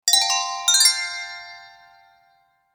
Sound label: winning bells